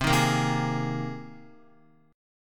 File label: C Minor 7th Sharp 5th C Guitar